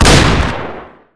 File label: shoot; weapons; tf; bolverk